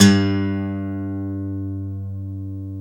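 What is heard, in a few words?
GTR G2 AC F; Synclavier Sampler Library; KEYS AND GUITARS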